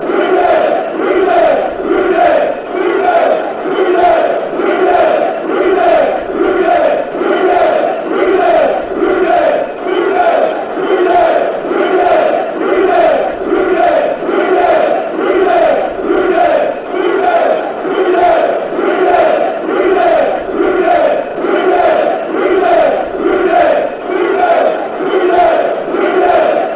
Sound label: chant; rooney rooney